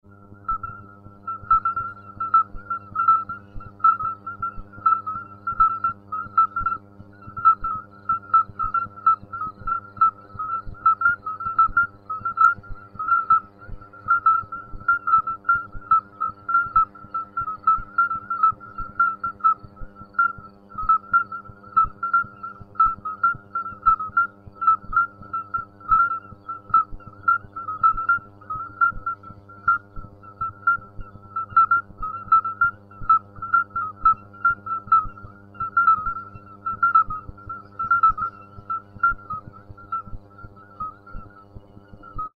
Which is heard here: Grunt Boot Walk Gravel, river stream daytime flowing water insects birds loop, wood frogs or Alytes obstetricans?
Alytes obstetricans